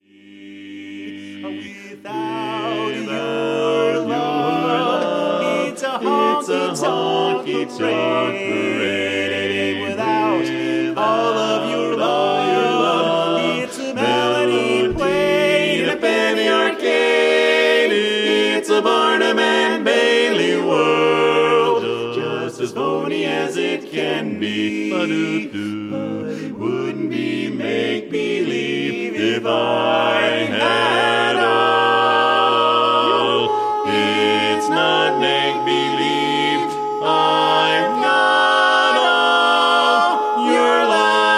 Barbershop Quartet

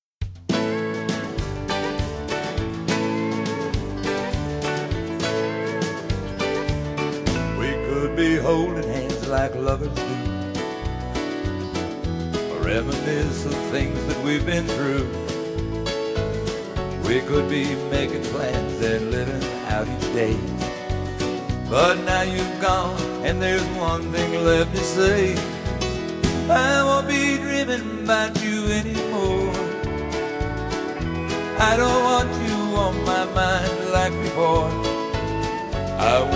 Country Singer

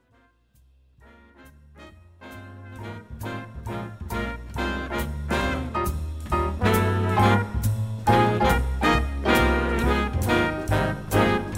Big Band; Atlanta

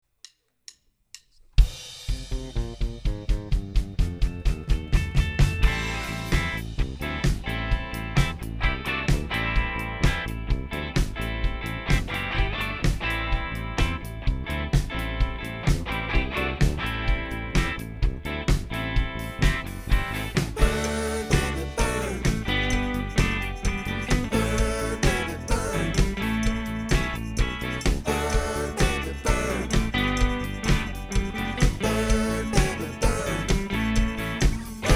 Cover Band

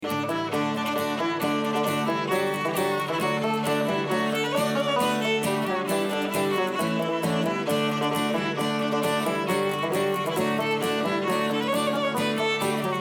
Acoustic Band; Chicago

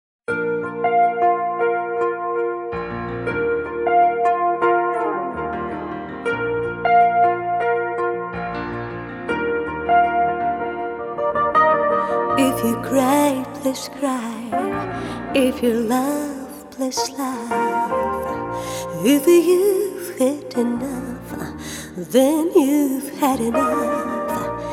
Singer; Moscow

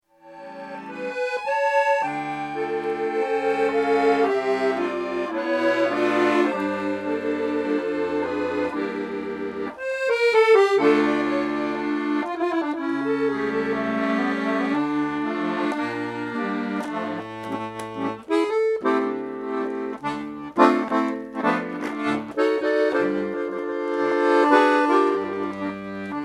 French Accordionist

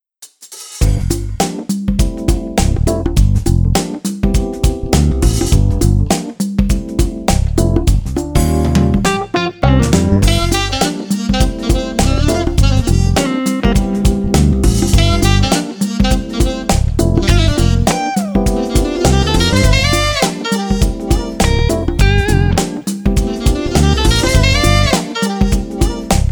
Jazz Guitarist; Atlanta

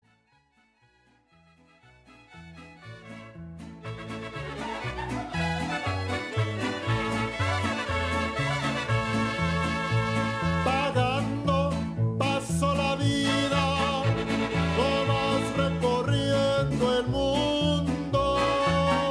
Mariachi; Austin

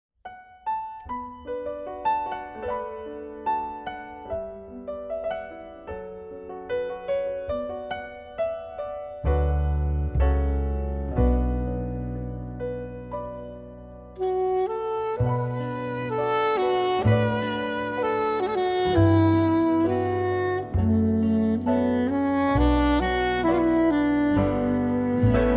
Irish Band; Chicago; This Chicago Irish Band is comprised of two musicians

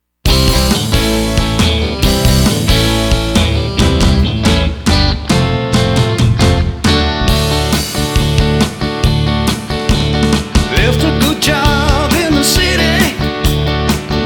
Classic Rock Band; Los Angeles Classic Rock Band

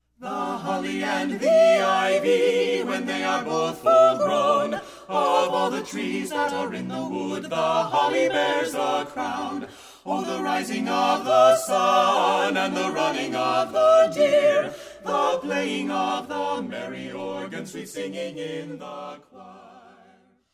Carolers; St Louis; The Holly and the Ivy

